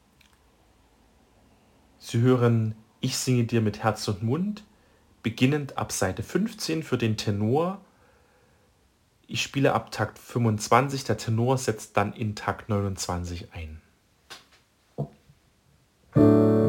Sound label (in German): Ich singe dir Tenor